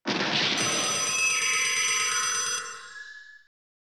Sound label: three sources 2ch ambient